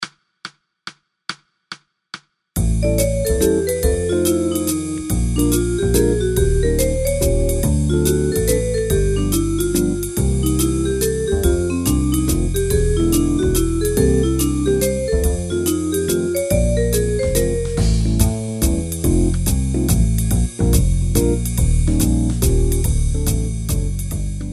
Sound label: Blues Triple Sample